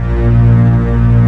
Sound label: ORCA CHORD; PlatinumPhattCD1; E-MU Producer Series Vol. 8 – Platinum Phatt; Mo Phatt Instrmts